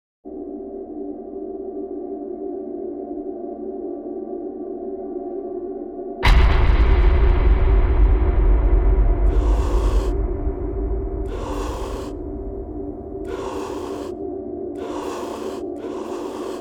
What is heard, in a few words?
Fission Reactor No Drums; Ninja Tracks